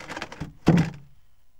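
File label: BOX RATTLE, Box Noises; E-MU Producer Series Vol. 3; Hollywood Sound Effects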